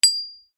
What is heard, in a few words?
impacts metal bars small x2